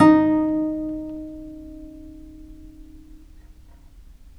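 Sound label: VIOLE PIZZICATO D#1, Historical Instruments; USB Soundscan vol.23; AKAI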